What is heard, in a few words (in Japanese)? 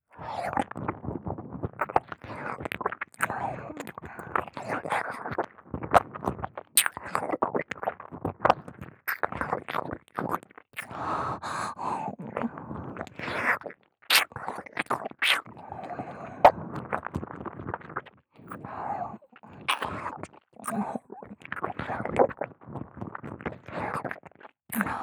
耳舐め（左）; ヒルダ